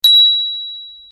Fahradklingel Baseball

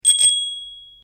Fahrradklingel Rassel

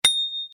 Fahrradklingel Retro Mini

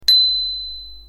Fahrradklingel Fussball; neutral

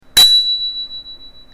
Fahrradklingel Basketball

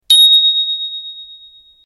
Fahrradklingel Radom

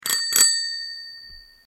Fahrradklingel Hamburger

